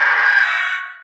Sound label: woodpecker; fowl-play; enemies